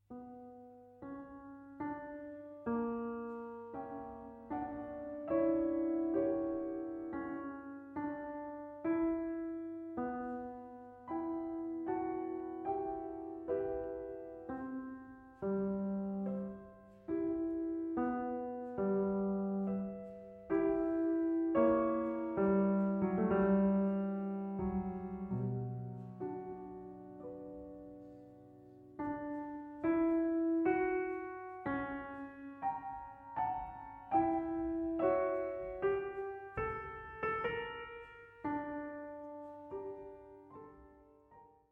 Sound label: Piano Sonata in F-Sharp Minor, Andante Con Espressione